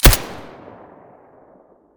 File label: silencer colt1911 stereo